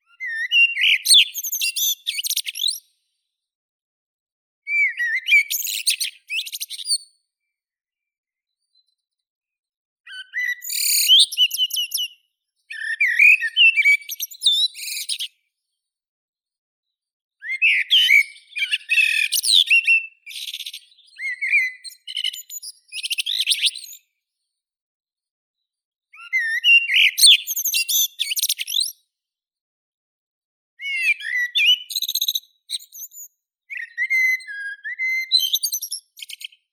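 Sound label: blackbird, Turdus merula; drozd chernii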